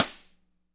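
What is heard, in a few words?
medium snare, natural kit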